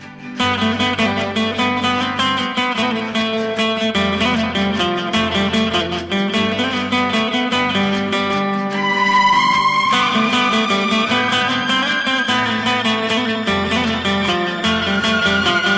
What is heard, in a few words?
GUITAR INSTRUMENTAL RINGTONE